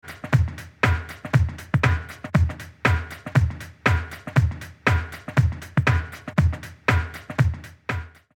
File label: Elec Drums; Camb EQ